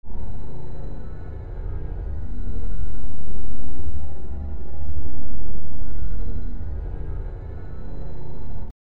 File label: MUSICAL